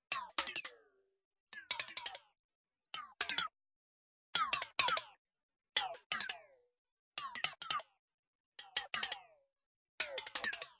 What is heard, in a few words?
170 bendy cymbal accents; 161 to 180 bpm